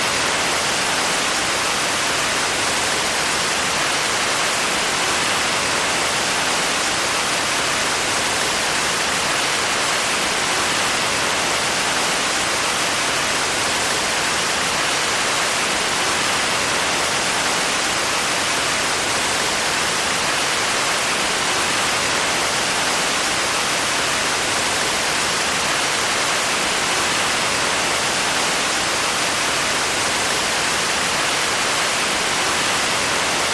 ambient waterfall near

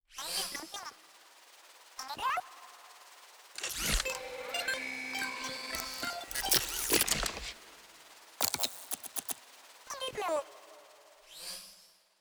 Drone; PCBS; Aorus

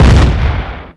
Weapon AT Gun-Heavy

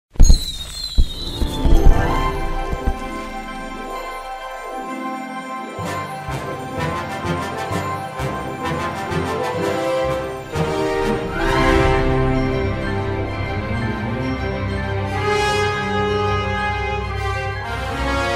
Victory!; 12 Labours of Hercules

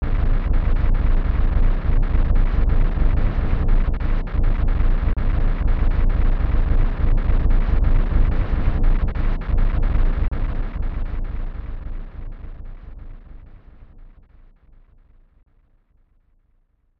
Unknown Ambience; 3x3 Eyes Seima Kourinden; SNES

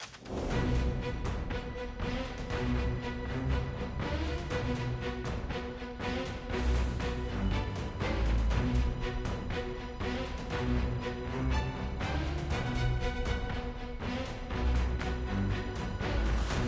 enemy move; devils demons android game music

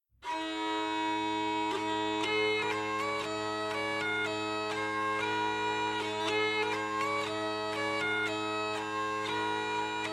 Tavern; assassin s creed unity gamerip 2014

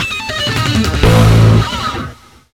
BGM Lose; treasure gaust gaust diver

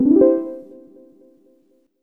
Memory Card Format; GameCube System Sounds; GC

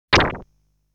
Enemy out; legend of hero tonma

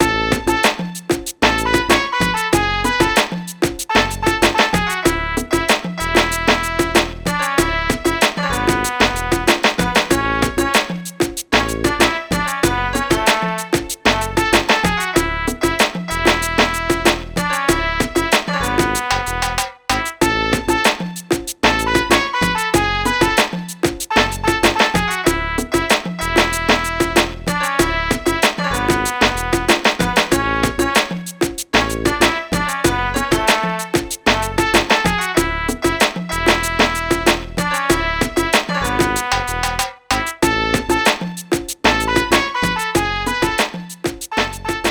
Diegetic Music - Fast Food (Restaurant Song); Ready or Not; Supporter OST

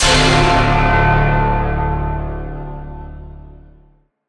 JINGLES Dark; Dracula 5: The Blood Legacy

